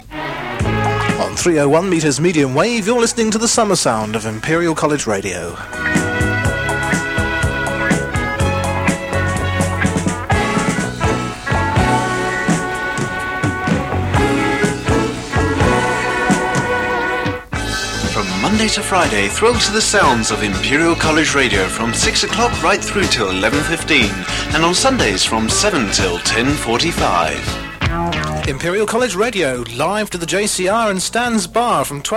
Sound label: jingles